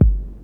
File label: BD Deep Air, Bass Drum; Lost Tapes From Mars